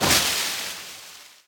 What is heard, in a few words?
Tree Leaves Hit